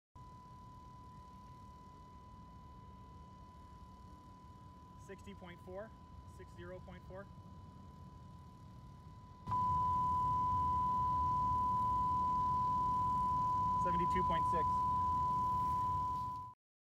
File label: Noise Suppression Technology; ZeroSound Systems Inc